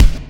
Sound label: kik; virtual drum sounds; live drums